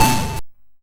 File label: Snare, YM, CHIPSHOP HITS DRUMS; ChipShop Deluxe